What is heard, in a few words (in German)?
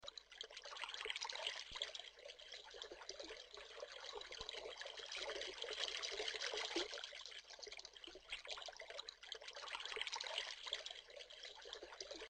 Fluss